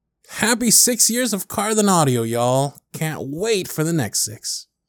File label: Ringtones Notifications